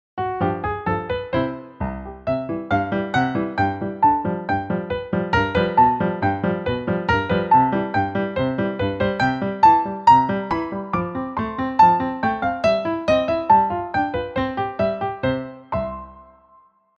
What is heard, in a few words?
Polka